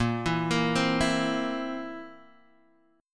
Bb Suspended 2nd Flat 5th Bb down Guitar Half Step Down